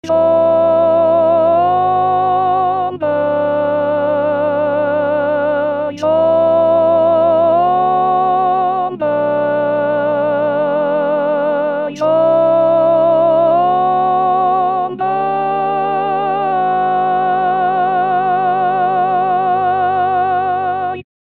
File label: Tenor, Barbershop Tags